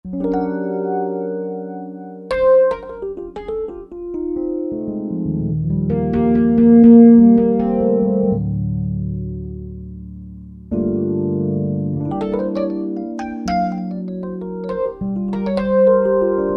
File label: Rhodes; Roland SR-JV80-03 Piano